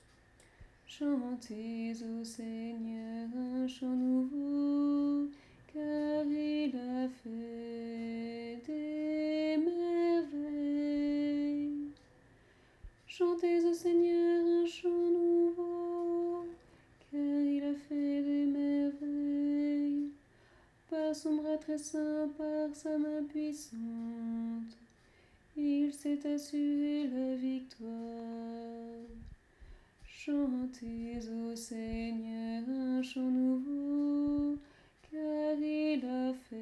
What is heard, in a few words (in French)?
Psaume 97 tenor; Antienne pour la messe du Jour de Noël; R/ La terre tout entière a vu / le salut que Dieu nous donne.